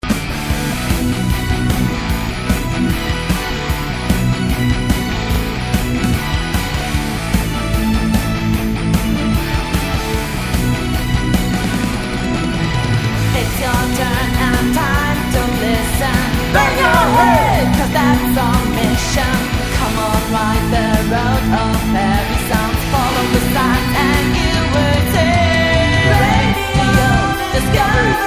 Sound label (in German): Jingel